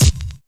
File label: kick, Kicks